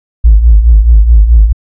Sub Hit, Bass; Sonic Foundry (Sony Creative Software); Underground UK House & Electro

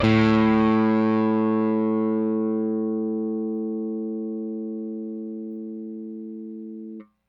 Rickenbacker Bass; Airwindows

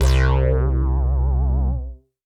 Synth Prophet Bass C; Electronisounds

